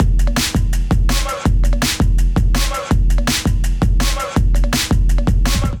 Dub Beat 165 bpm; MIDI Clips; War Dub; Ableton; Factory Packs; Skitter and Step

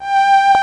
strings G5; Ableton Foundation FreePacks; Foundation Glitch Toys & LoFi

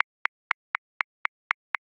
Motorik, Drum Booth, Grooves; Ableton

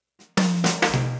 NuJazz Swing Drum Intro, Jazz

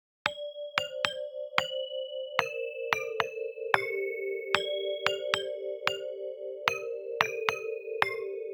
Metall Xylophone Melody G Minor 112 bpm; MIDI Clips; Tonal; Ableton; Factory Packs; Lost and Found

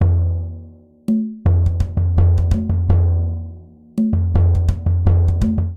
Rhumba Funk B Surdo 166bpm, Latin Percussion; Ableton; Factory Packs

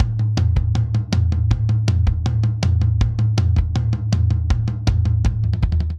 Energetic Pop Buildup 160 bpm, Drum; 4 4; MIDI Clips; Ableton; Factory Packs; Drum Booth

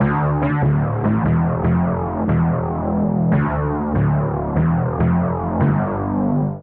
Bass D Minor 145 bpm; MIDI Clips; Ableton; Factory Packs; Skitter and Step

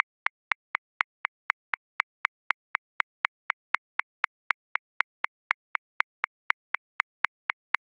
Driving Rock, Drum Booth, Grooves; Ableton

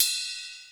Ac Ride; Drums; Ableton Foundation FreePacks

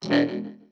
Neo-Wave Vocoder, Pop Rock

